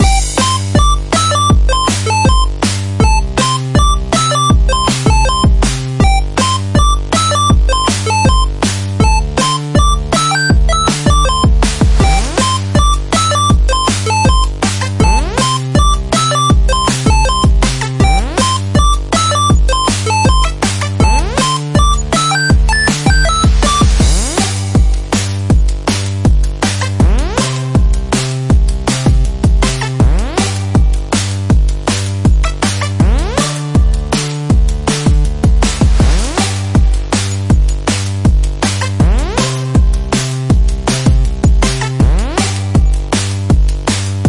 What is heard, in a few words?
Background Music